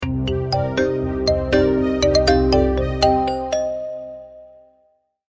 startup, phonetones; Plus GSM Poland

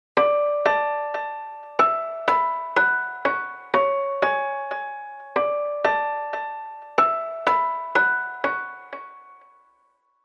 Awakening Alarm, Piano; HTC; Fixed Piano

